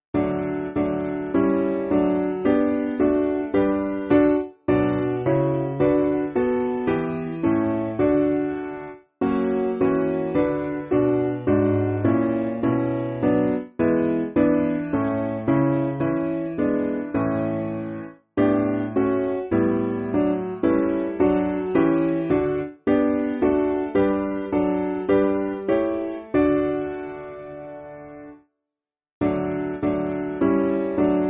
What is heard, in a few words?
Piano